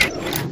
lever, buttons; Garry's Mod; hl2

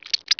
leech move; Emperor - Battle for Dune

